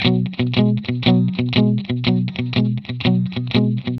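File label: 120 B, Vintage Blues Guitar rhythm; USB Soundscan vol.22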